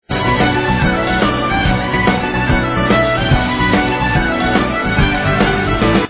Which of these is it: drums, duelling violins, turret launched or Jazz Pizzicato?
duelling violins